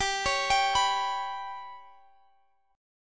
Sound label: G Major Flat 5th G down Guitar Standard; GMb5 arpeggiated; capo=2